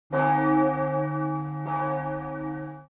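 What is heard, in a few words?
Bell Church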